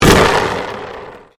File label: Convolution Pulse